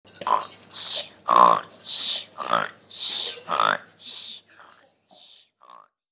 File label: snoring